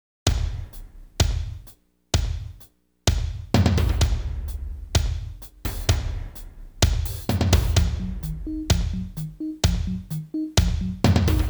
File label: Dungeon; Dark Sun Wake of the Ravager; ms dos